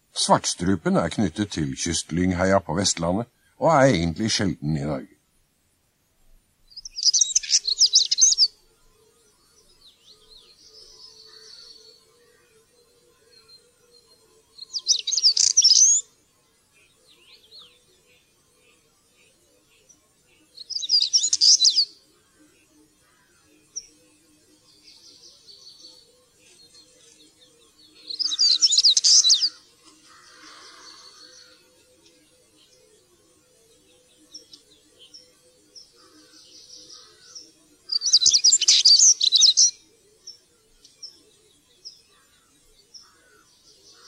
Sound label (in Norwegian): svartstrupe